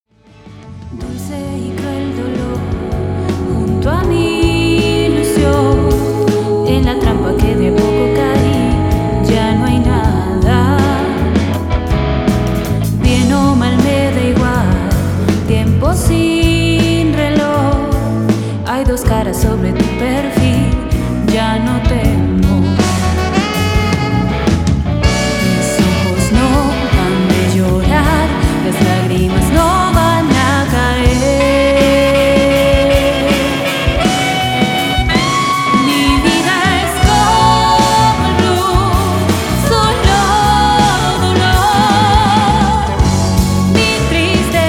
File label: Folk Blues